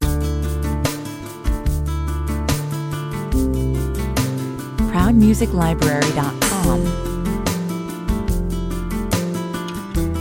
Smooth and melodoc latin ballad with spanish guitars, 145 bpm